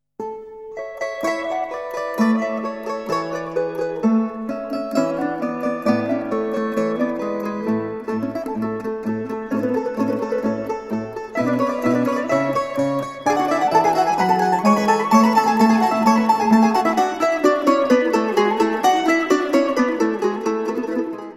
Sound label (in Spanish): clarines